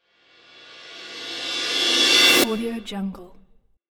cymbal whoosh

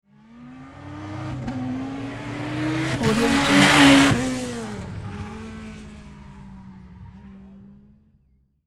race car pass by; sonicmood

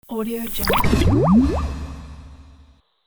oscillation swoosh

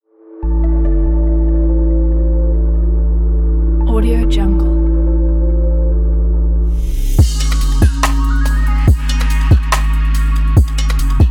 hiphop; tony-online

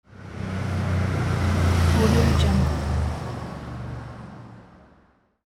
police bike passing by

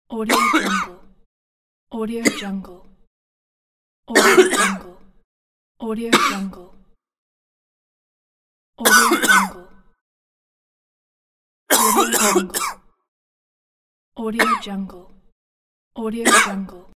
coughing man

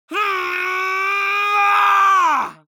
human vocal male scream forceful long

